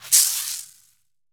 caxixi; Brazil

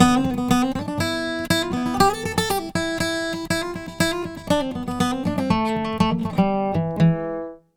Acoustic Guitar Lead, Ukraine Gypsy; Sonic Foundry (Sony Creative Software); Continental Drift Aftershocks

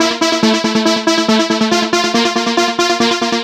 NRG Arp, trance

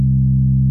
REAL DUB; Zero G; Man Machine

